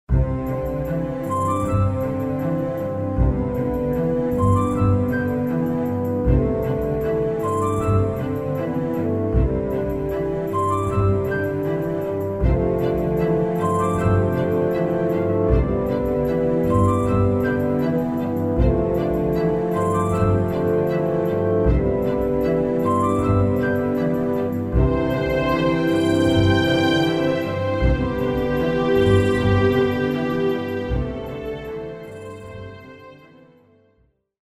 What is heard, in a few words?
Classical